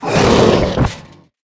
warrior death; ScorchedCanyon

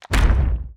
totem golem move

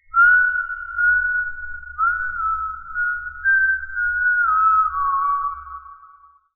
cursed temple idle

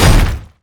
Spear Of Judgement Hit; Nuke

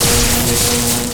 Dark plasma be Shot; GunCrasher

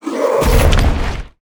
Assassin death; ScorchedCanyon

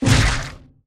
Raised Gladiator damage

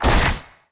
Ashes Butcher damage

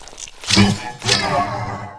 blade attack

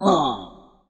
cursed temple damage

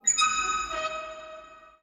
cage

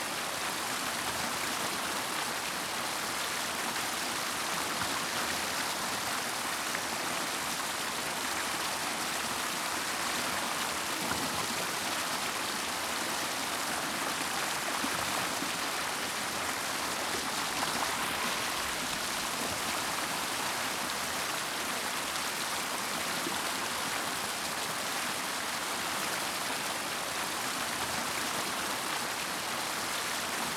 waterfall; Kethotum

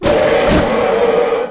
death; balga

